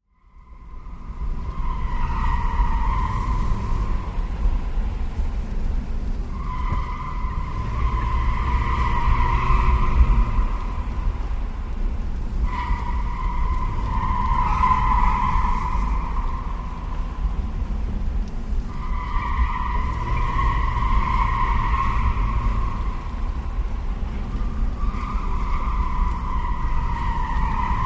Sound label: Lincoln Towncar Skid